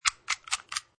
Lock

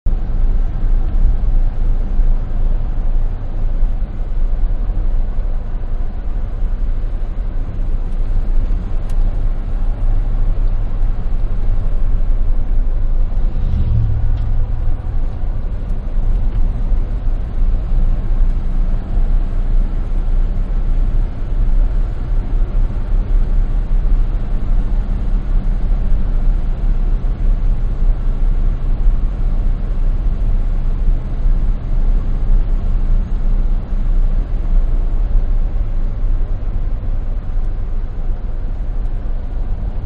Driving Windows Up Interior, Van; Cars; Vehicles; Car Sound Effects

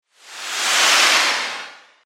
Whoosh, Small, Rocket, Pass, By; 5.1

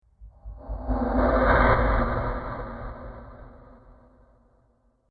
Staged Swoosh Slow; Whoosh Sound Effects; sound design

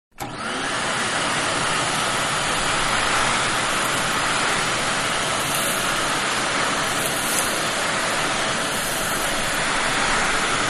Vacuum Cleaner-Vacuuming A Floor; Cleaning; Offices; Manhattan Production Music

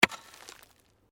Digging Pick Hard Earth

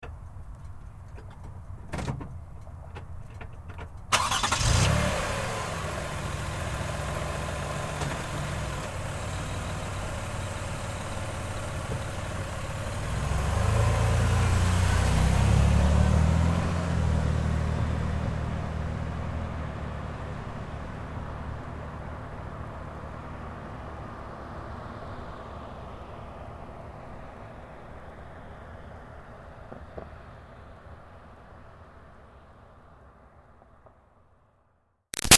Car Highlander Start Away Slow; 2005 Toyota Highlander V6; Cars; Vehicles

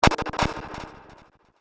Slow Motion Soft Impact; Miscellaneous Impact; Andrew Fleming

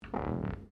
GLASS SQUEAK FINGER

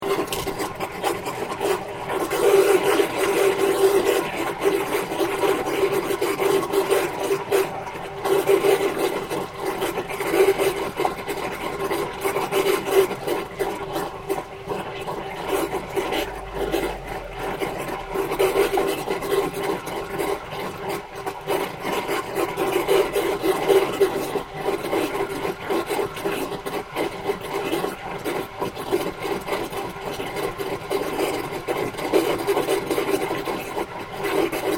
Industrial Pump Draingage Underground Loop

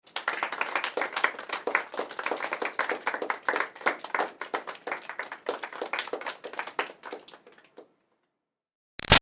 Crowd Small Applause Light Golf Clap; Interior; Human

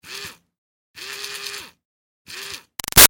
Toy Fairy Wand Revs Short; Miscellaneous Toy; Sound Effects:Toys; Big Room Sound

